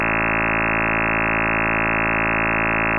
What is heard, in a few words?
55.125 periodic chirp